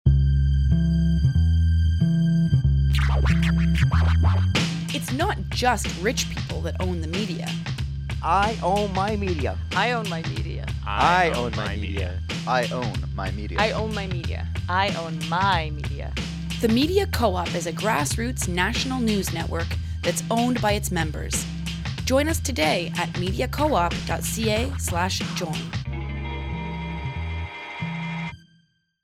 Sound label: hiphop